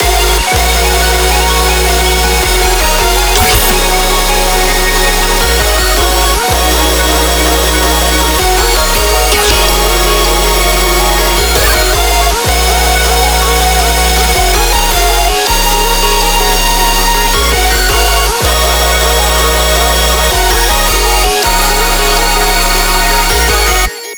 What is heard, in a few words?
SAWS to resample